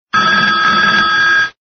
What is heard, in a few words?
Old phone